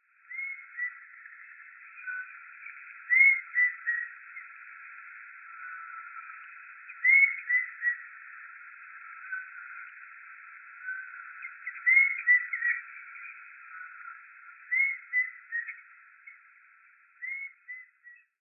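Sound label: Eurasian Bullfinch, muroran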